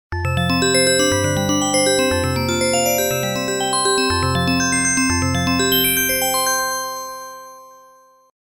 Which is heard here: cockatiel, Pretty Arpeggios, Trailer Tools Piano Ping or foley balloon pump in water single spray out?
Pretty Arpeggios